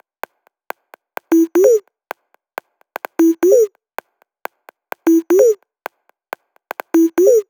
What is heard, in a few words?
128BPM Kit; VFH1 128BPM Roboto Kit